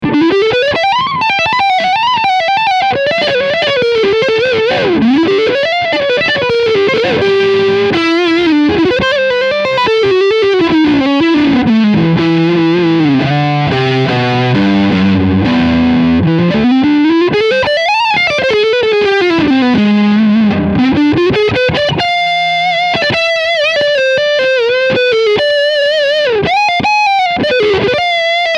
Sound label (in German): Leadsound Front HB